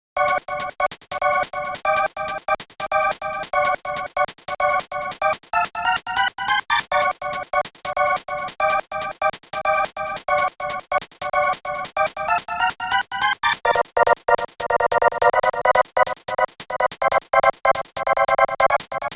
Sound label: Warning